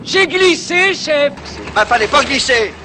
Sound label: lock chime; Tesla; lock sound